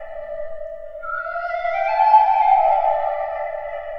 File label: Trill Flute; Sonic Foundry (Sony Creative Software); Ma Ja Le Ethereal Textures; Flutes